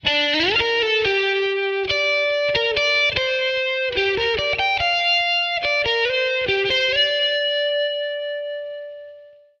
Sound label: Win; Project Reality BF2 1.6